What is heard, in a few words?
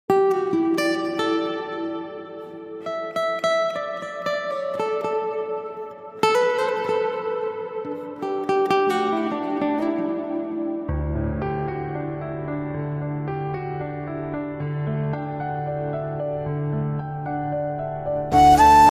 flute ringtone